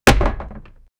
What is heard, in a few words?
cannonball impact on a wo, nautical